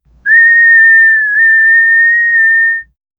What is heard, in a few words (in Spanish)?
un aullido de lobo, atmospheric